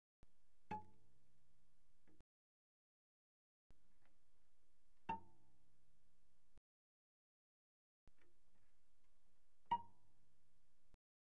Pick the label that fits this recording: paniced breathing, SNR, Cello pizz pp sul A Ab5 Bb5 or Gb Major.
Cello pizz pp sul A Ab5 Bb5